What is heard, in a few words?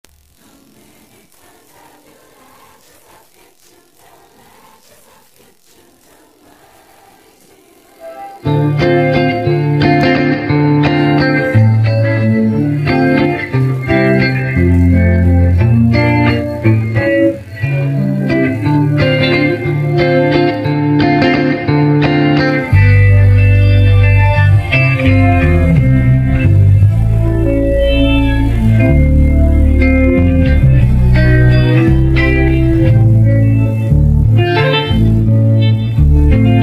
Instrumental